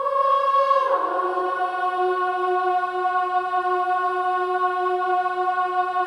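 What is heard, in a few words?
Voices, INTERVALS, 5TH DOWN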